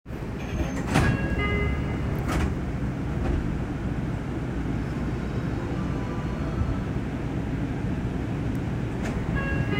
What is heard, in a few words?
train sounds; 西武池袋線仏子東